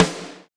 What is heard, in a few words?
SD Real, Roland; Sound & Vision - Gigapack I